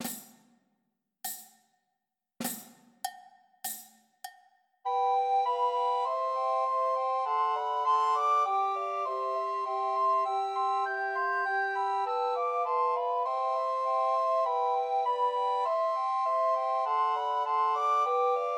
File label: Trios for Two Descant and One Treble Recorder; Once in Royal Davids City; Christmas